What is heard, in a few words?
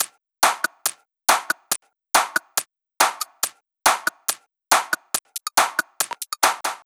drum Loop 140BPM Perc